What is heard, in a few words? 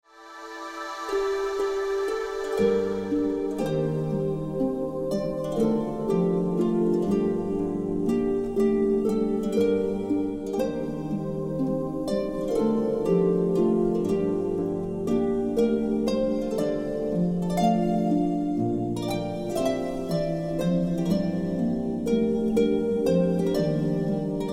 bgm